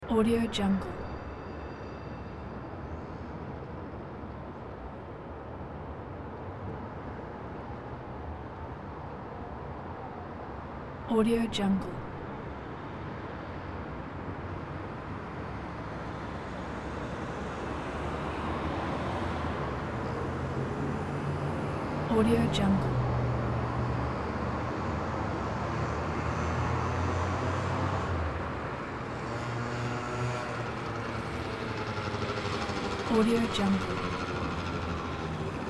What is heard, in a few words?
city main traffic motorcycle balcony